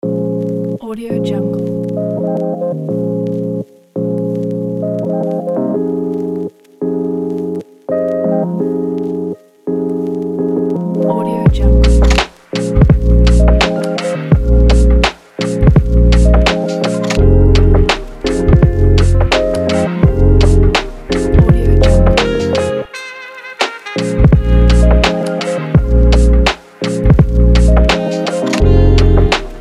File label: lofi chillhop